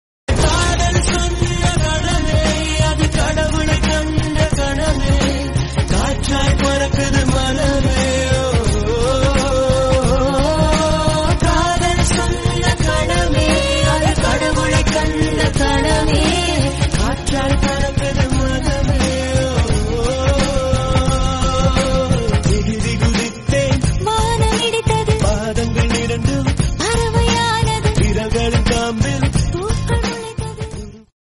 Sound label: Tamil Song